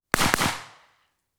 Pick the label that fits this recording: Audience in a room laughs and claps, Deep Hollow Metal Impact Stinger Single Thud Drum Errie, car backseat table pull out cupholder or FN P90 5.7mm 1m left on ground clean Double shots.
FN P90 5.7mm 1m left on ground clean Double shots